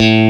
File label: Elec Gtr clean; cubic